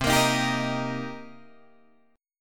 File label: C Minor 7th C Guitar Open D